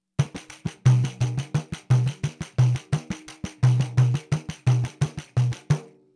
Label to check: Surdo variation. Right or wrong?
Right